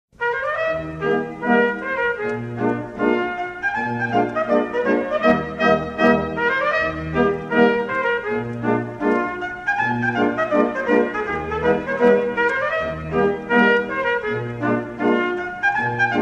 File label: Schottische Quadrille; Figure 3